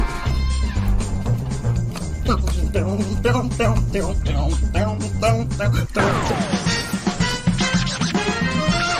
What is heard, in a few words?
singing; ishowspeed